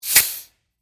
Air Hose Release, Industry, Air, Hose, Release, Tool; Air Pumps; Machines; BlastWave